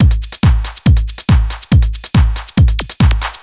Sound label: hard beats 140, hard trance beats